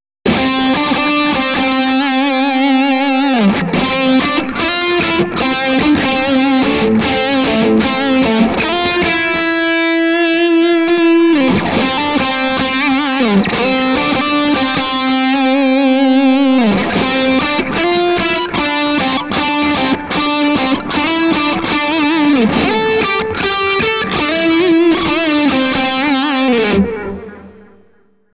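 Pop Lead, Overdrive + Distortion, Echo On Delay, chitarra elettrica Kramer; Kramer (Bridge pickup); OD Drive: 3/10; OD Tone: 6/10; OD Volume: 5/10; Gain: 5/10; Middle: 6/10; Presence: 4/10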